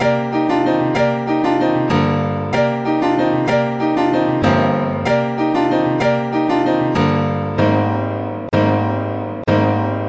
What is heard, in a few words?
095 grand piano soul chops; KEYS ORGAN PIANO LOOPS